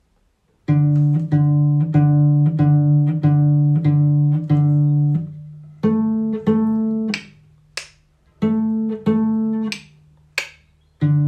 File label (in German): Cello pizz; gezupft